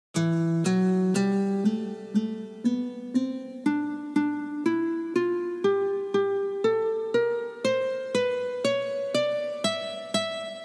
Uprazhneniya dlya rastyazhki paltsev

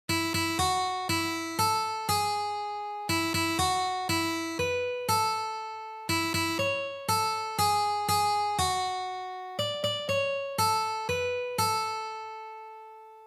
na gitare na odnoj strune; S dnem rozhdeniya

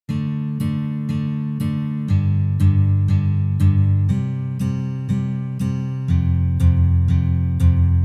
Peremennyj shtrih

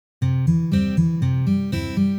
Lomanye patterny

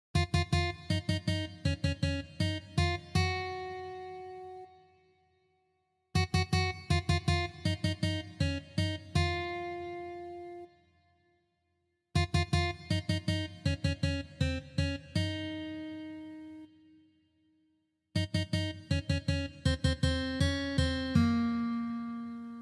na gitare

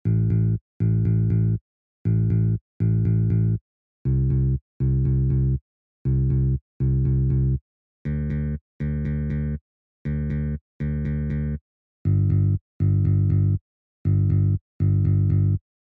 Postroenie gitarnogo akkompanementa basovaya liniya

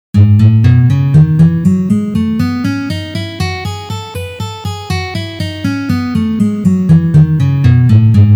Gamma Mi mazhor 1 pozitsiya

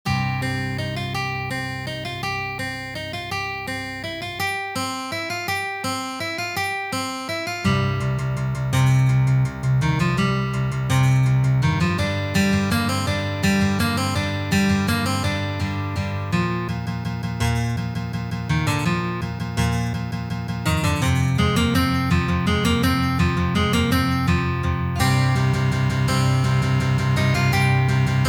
na gitare; Igra prestolov

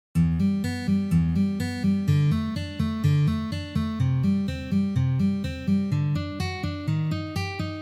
razbor

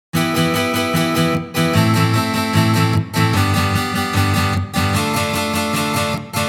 CHechenskij boj na gitare

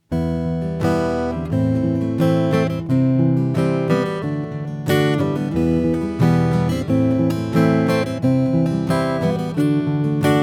Boj s pereborom

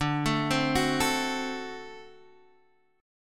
Perebor D

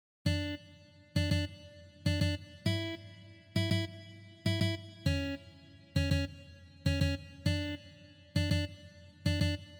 Uprazhnenie sinkopy

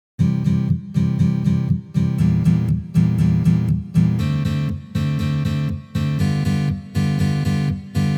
Progressiya s dim akkordami

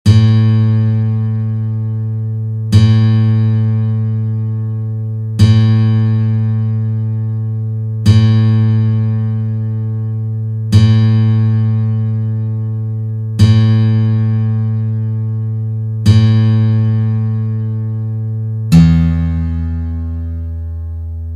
struna Zvuki strun akusticheskoj gitary

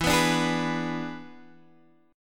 Boj E7

